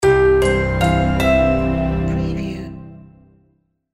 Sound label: Happy Toy